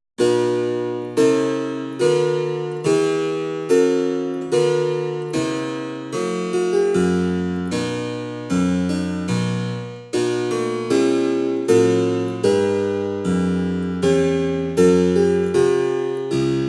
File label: English spinet choral